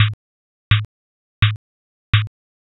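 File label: footsteps leather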